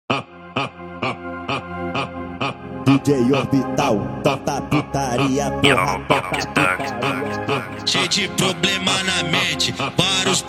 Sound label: Dead Violin Funk speed Up